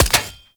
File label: Fishing Spear Damage Hit; Weapons; Venus Fishing Spears; Warframe